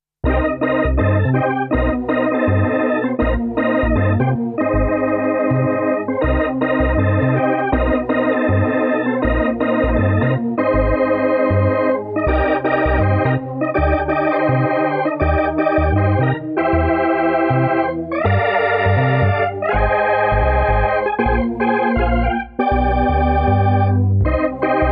Organ

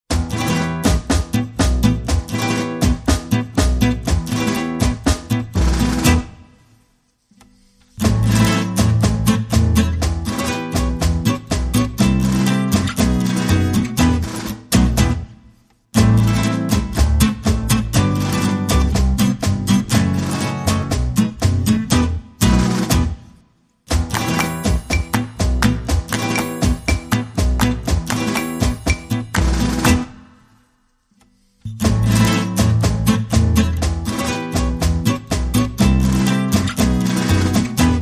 No Violin No Accordion

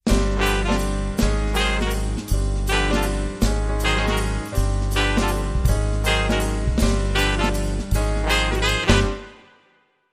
Jazz Brass, Jingles

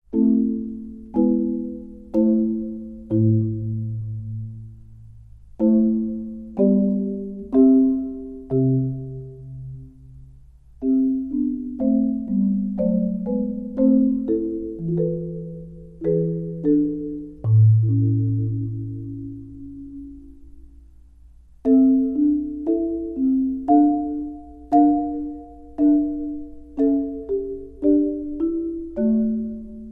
Chorale No 48; Johan Sebastian Bach (PD)

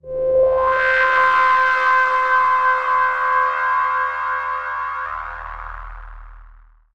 Celestial Ring Tone

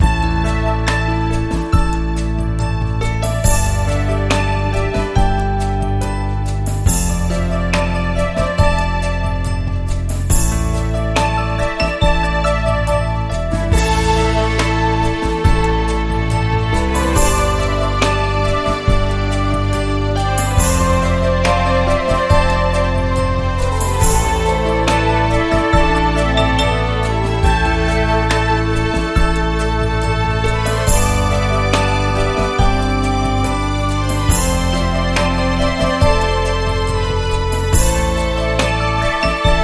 Deep Ocean

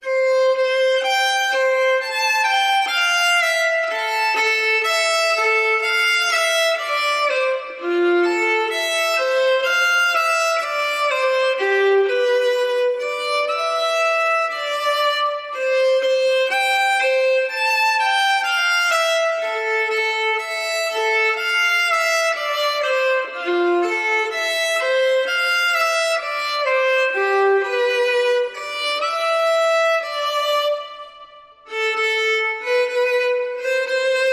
Violin

